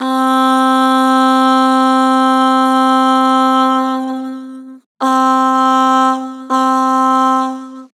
"Aah", Harmor Vocals

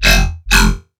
Riddim Bass Hit F# Wrench, One Shots; Ghosthack; Riddim Essentials 2020

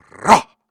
Grunts and Chants, Viking Vocal

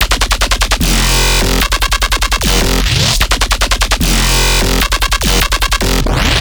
Bass Shot F 150, Dubstep; Dubstep Basses; Loops; GhostHack; Advent Calendar 2021

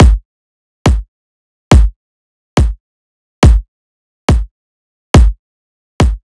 Riddim Drum Loop 140 Kick; Ghosthack; Riddim Essentials Volume 2; Full Drum Loops

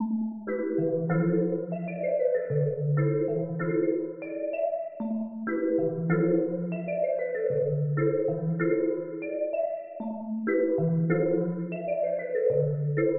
96 BPM A Min Pluck; Melody; Loop Stems; Cymatics; Infinity Melody Collection (Preview); Rooftops

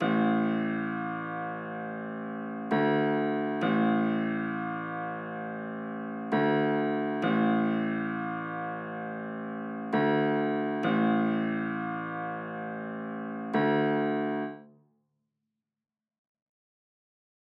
133 BPM A Min Low Piano, Drill; Melody; Loop Stems; Cymatics; 2022 Melody Collection; Smoke

